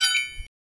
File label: chime; sorcery